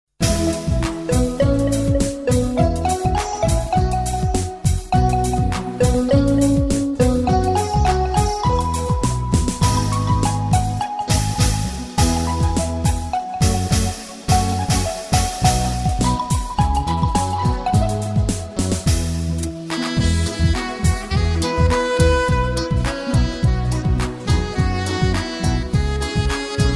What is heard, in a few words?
beat